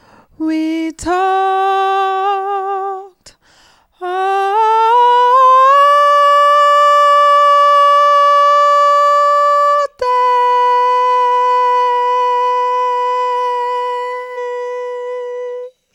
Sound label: epic diva